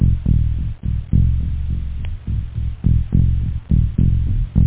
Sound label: dumm dumm, loop; da capo vol1